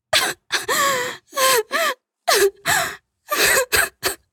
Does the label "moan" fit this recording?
Yes